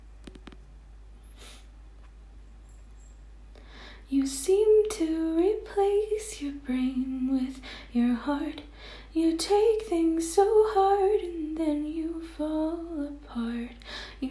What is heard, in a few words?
cover in a bathtub